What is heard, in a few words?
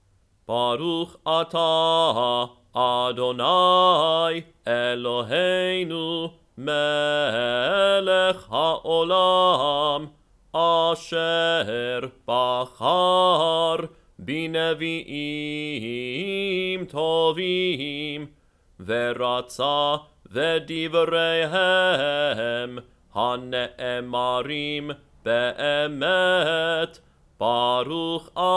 Blessing Before Haftarah